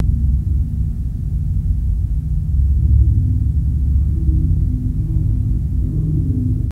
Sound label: Rumble Low Amb Hiss, earthquakes